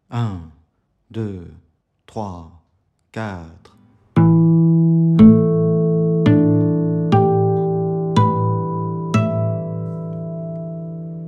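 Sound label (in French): harmoniques 7e frette 060 bpm, guitare; harmoniques 12e frette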